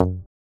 Bonk

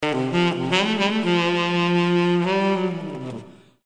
Smooth Sax; MSN 2012

